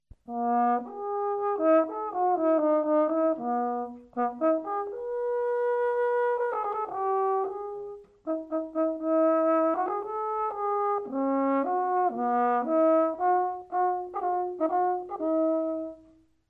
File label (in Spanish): trompa